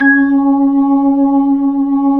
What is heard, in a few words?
B-3, Jazz